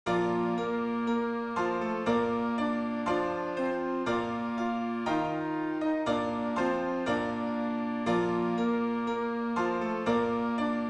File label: Trio Bb